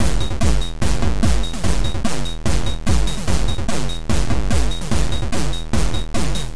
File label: BEAT